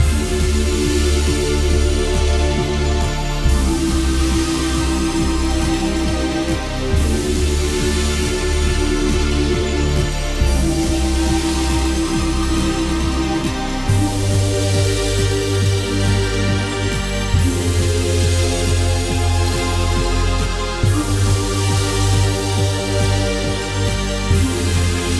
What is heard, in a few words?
Strange Game Menu, fantasy